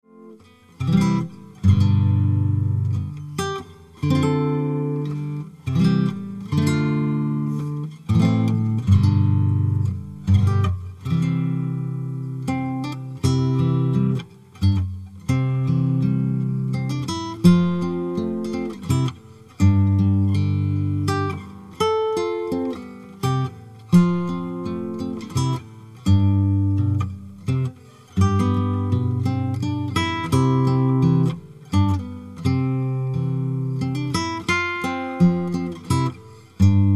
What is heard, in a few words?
C'est Une Gavotte